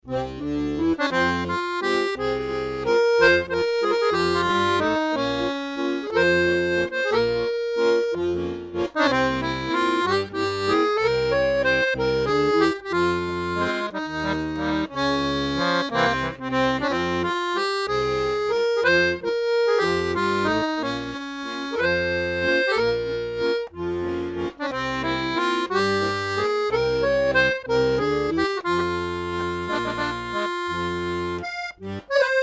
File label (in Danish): Musette Harmonika potpourri